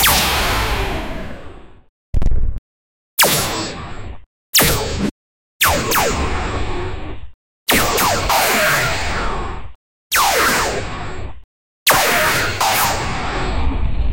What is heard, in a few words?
powerful punches of a sup; fighting